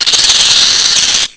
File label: bug hurt; Blade of Darkness